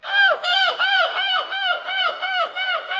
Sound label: the cry of a giant seagull loud and heavy